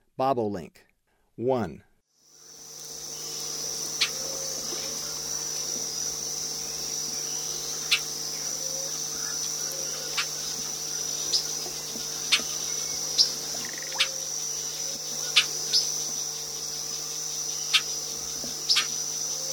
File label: Bobolink; S. America; Eastern Lowlands